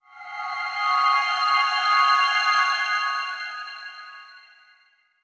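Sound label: Music Pump Flourish; bolt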